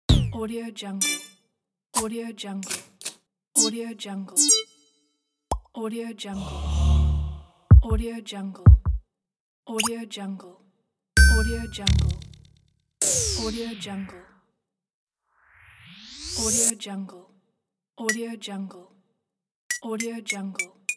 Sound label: game sound pack